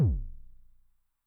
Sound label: BD MOD [Pitch+Depth+Overdrive], 909mod, BASS DRUM